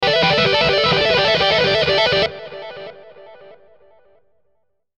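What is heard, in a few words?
Musical Element, Drum Snare March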